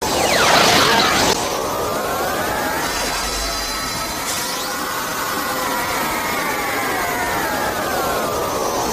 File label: charge